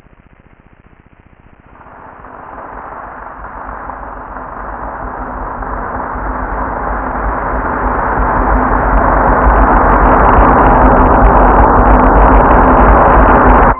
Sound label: WIND